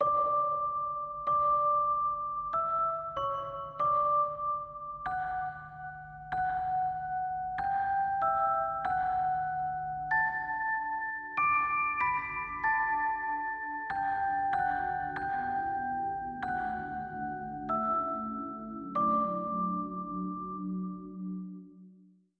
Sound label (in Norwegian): Deilig Er Jorden (Norwegian Christmas Song Based On Schesien Melody), No FX